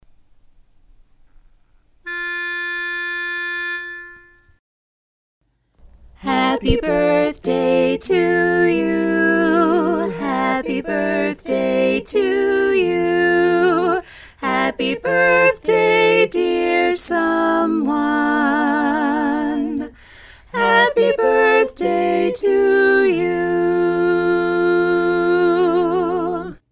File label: Happy Birthday Lead